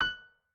piano

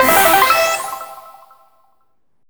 RESULT WIN; maimai

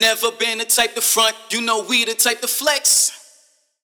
vox

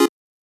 Chord

